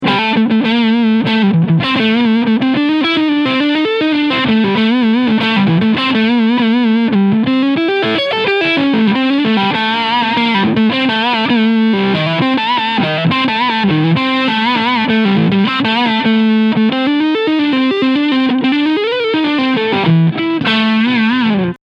Harley Benton Fusion T Leadsound neck humbucker sc mode; 25th Firemist